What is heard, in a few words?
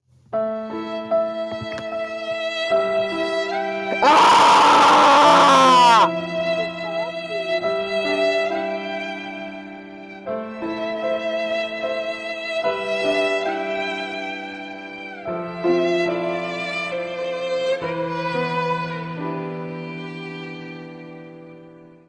sad violin and dying child